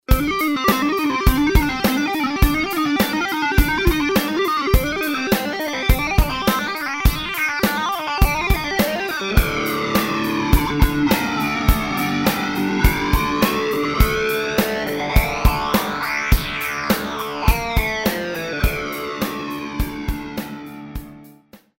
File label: flanger